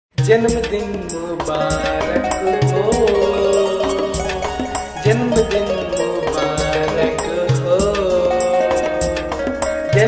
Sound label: Happy Birthday Song in Hindi Drums